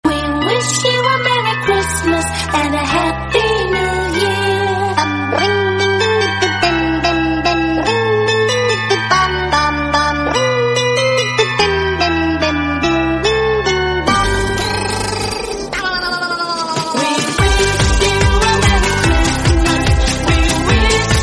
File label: Wish You A Happy New Year English Song